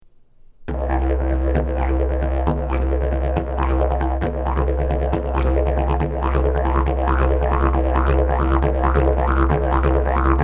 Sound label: didgeridoo